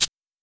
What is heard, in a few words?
Linn, LinnLinn Drums